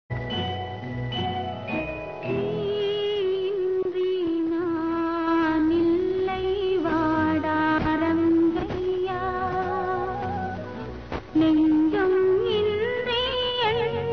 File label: sad, tamil